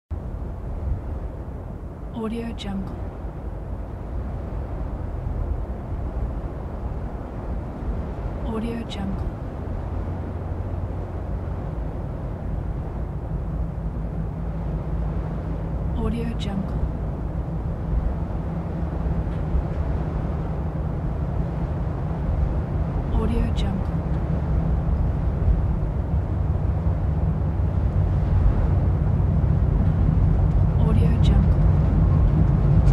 the rumble of the train

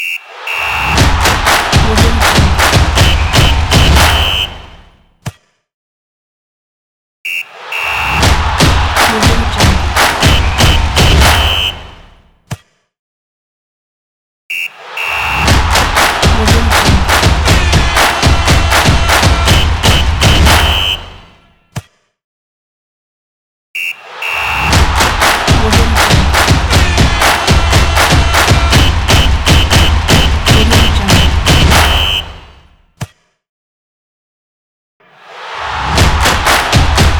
stadium football stomp logo

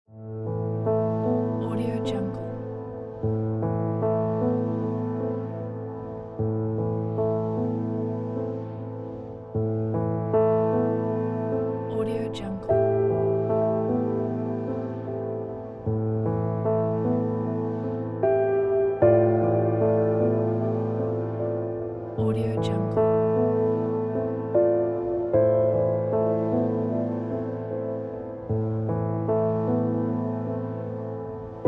sentimental piano

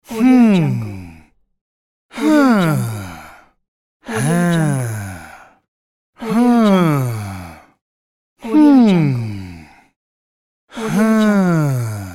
man thinking voice pack

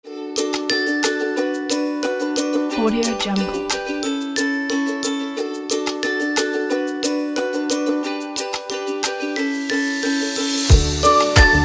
positive ukulele motivation

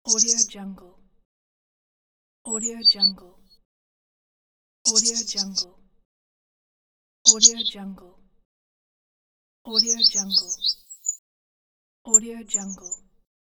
birds; wildemotion

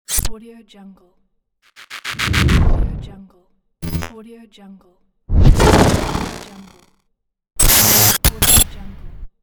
glitch transitions

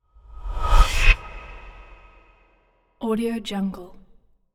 quick whistle woosh; ayslum

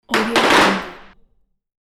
machine gun

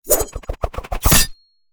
knife throw nogore; soundmorph

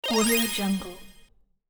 magic arp button

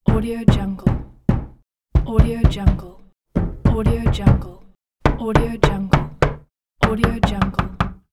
knocking on door

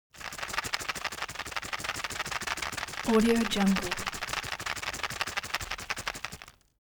foley plastic drug blister shake

